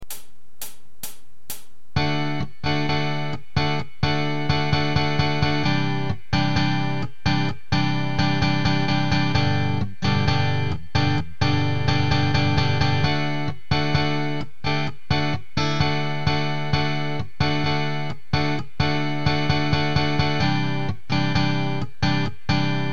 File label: suspended chords exercise, guitar